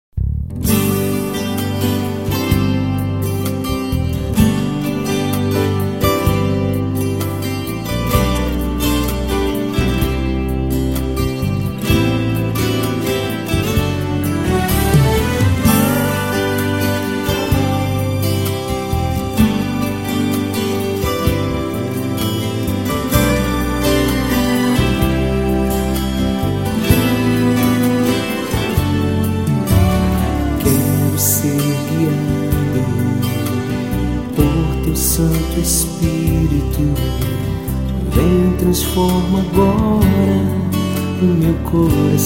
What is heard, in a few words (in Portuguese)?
gospel